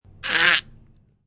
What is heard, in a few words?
Toddler